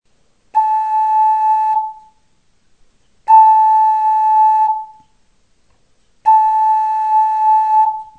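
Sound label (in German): Beenden richtig, Okarina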